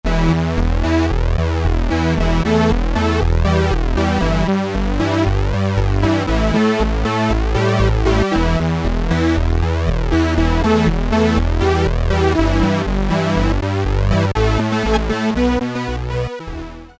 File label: Techno Lead, Roland JX-3P